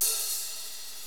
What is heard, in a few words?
openhi; hofclient